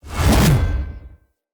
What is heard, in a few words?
Spear Whoosh Heavy; Weapons; Enemy; Spears; NimrodSpear